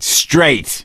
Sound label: positive noise, gambler, survivor voice